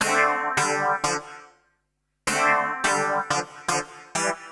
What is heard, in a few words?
Synt; FUNKACID5